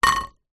potato chips crisps cheese puffs handfull drop onto plate; zapsplat